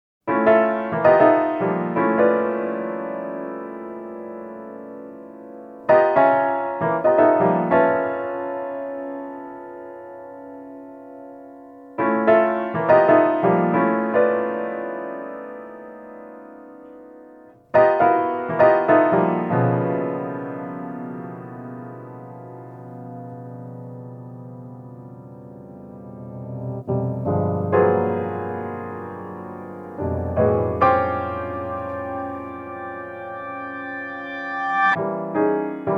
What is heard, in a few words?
piano solo